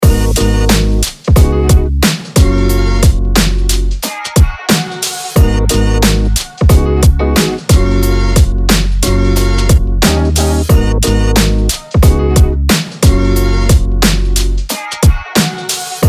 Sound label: commercial drive